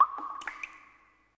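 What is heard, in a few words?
ambience water drip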